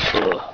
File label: struck; First Knight